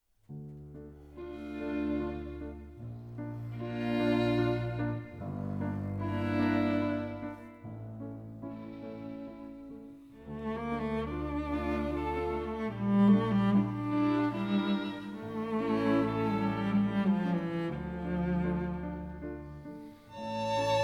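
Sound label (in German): Ständchen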